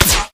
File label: imp bullet energy titan; Titanfall 2